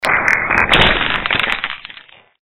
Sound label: distorted electronic sound